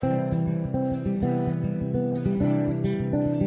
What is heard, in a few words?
country